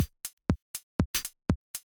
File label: Minimal loop